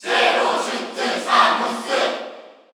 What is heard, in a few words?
Cheer Korean; Zero Suit Samus; SSBU